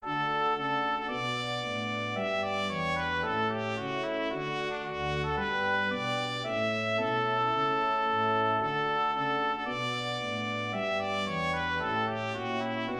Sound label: Trumpet tune